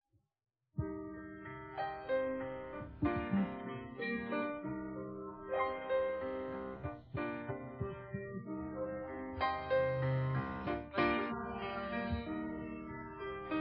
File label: piano